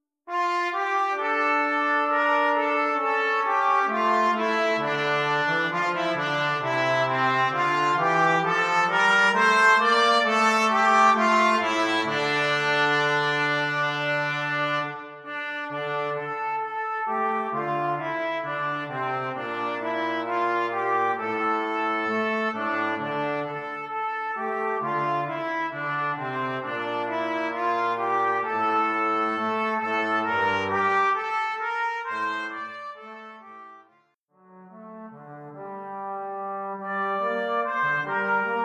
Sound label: Trumpet Trombone DUET; More Carols For Two